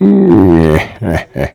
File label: mmm he he